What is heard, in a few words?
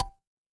feedback merge